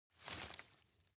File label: grab short soft